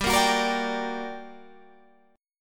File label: G Minor Major 7th, down, Guitar, Open G